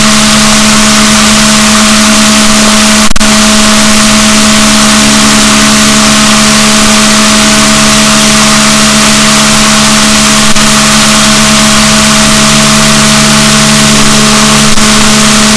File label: rorquals negatif, boat; Balaenoptera Physalus Chile